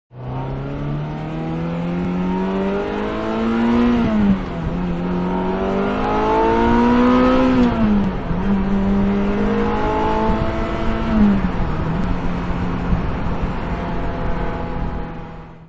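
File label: Ferrari F355 spider interior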